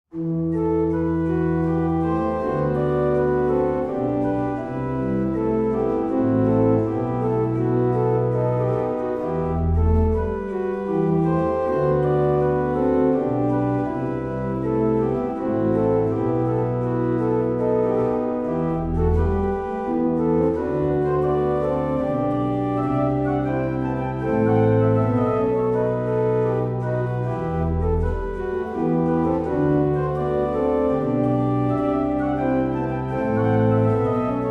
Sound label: Ciacona in e-moll, orgelbau